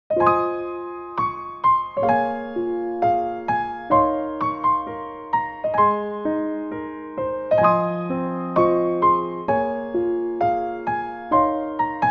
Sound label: bgm; yourdiary